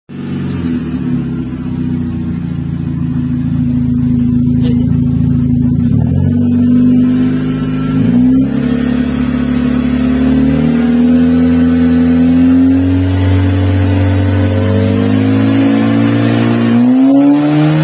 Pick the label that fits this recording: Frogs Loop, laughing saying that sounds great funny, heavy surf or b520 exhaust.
b520 exhaust